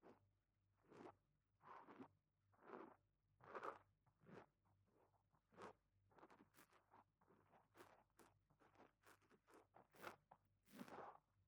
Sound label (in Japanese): 耳かき 左, ASMR